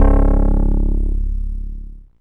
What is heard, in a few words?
C#, PIANO; Big Fish Audio; Synclav FM